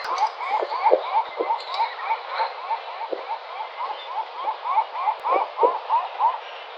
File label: Larus livens; ecologia paisaje sonoro